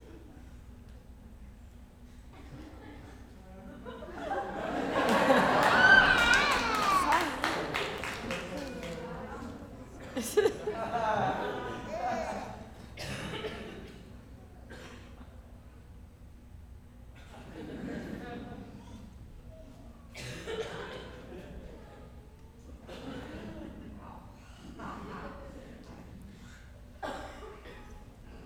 Apresentacao muda com plateia rindo nao muito alto, tosse e cantarolar; multidao; Colecao Sonora do Cerrado

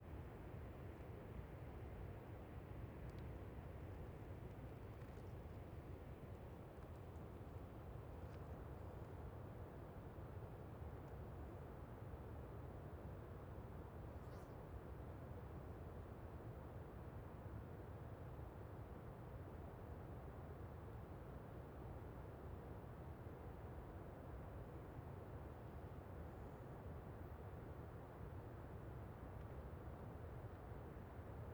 Ambiencia em cerrado aberto com cachoeira ao fundo

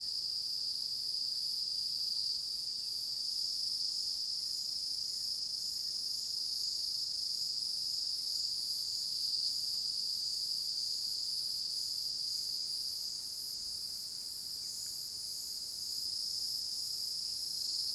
Coro de cigarras estridente, depois começa a ventar nas arvores; insetos

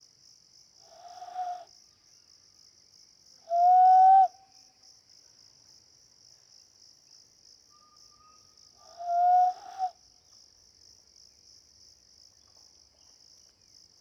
Pessoa assoviando na mata com grilos e passaros

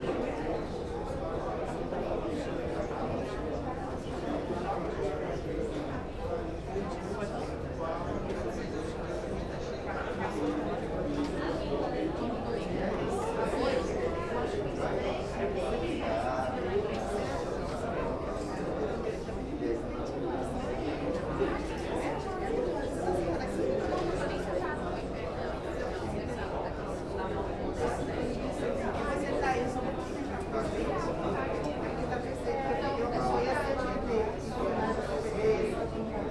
Vozerio em sala; multidao; Colecao Sonora do Cerrado

